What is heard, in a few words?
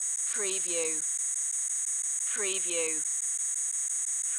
Alarm Computer Loop; sound effects bible